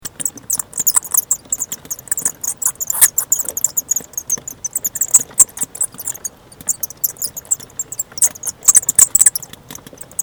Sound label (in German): Soziallrufe